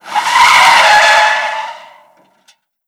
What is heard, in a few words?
car skid; favela; Call of Duty Modern Warfare